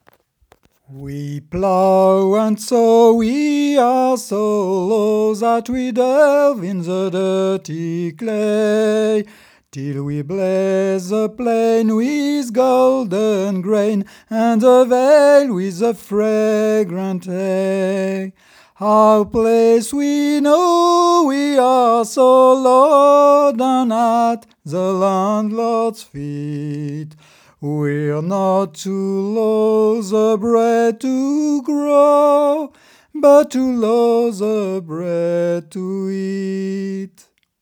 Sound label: Voix; Song of the lower class; Version originale Ernest Charles Jones (1852)